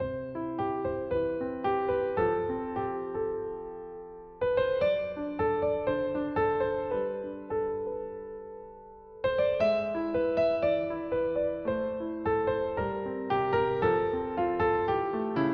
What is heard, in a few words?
Piano Solo